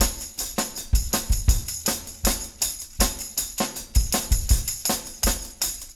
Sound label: 80 bt tam